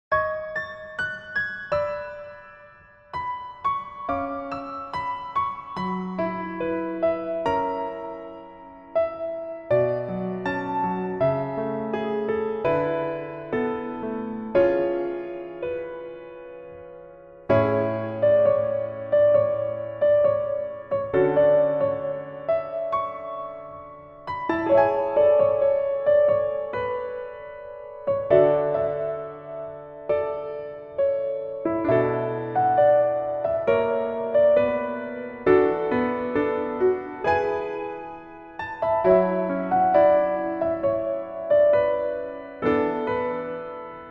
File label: Piano MR, 멜로디MR